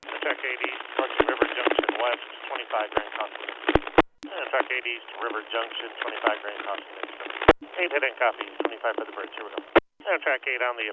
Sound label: Amtrak